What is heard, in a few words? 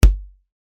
kick dry click